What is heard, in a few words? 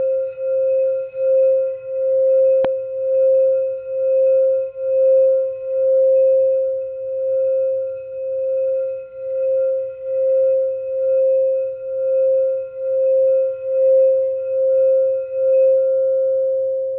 528 bowl